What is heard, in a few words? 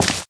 white tile, footsteps